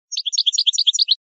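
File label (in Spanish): floreo tovi tovi, canario; campero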